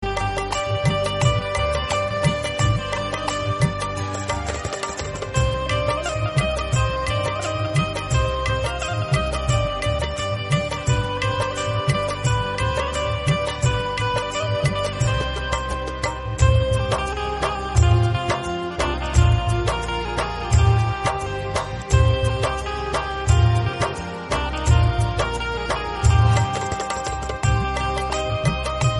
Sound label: instrumental